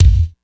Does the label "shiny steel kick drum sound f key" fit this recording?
Yes